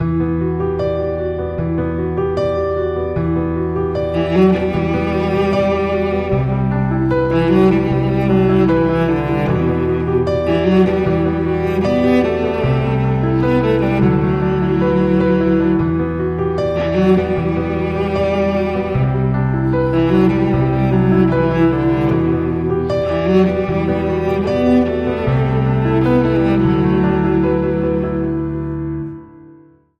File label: No Percussion; 30sec